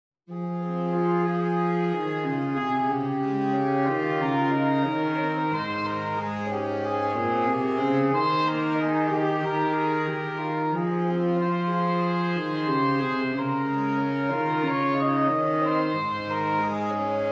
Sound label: Sax trio; flexible saxophone trio